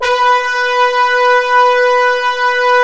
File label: BRASS B3; Giga Samples Collection; SAX+BRASS